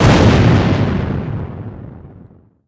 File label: laser explo; brawl stars